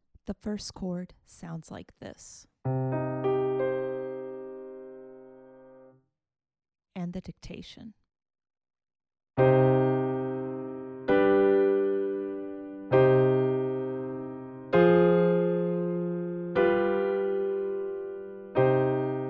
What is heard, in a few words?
harmonic dictation